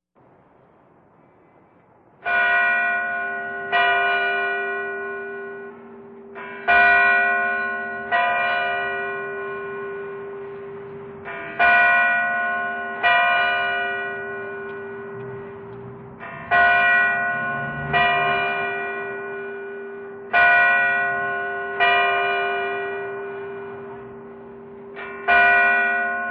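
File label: bells ringing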